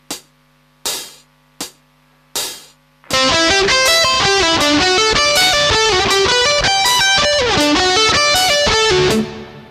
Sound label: advanced arpeg